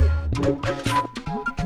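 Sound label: Music Dpad Base 145; from dust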